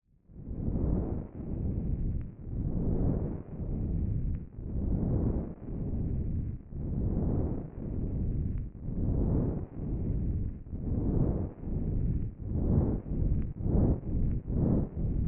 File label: Sporadic Heavy Breathing; TTSH Patchbook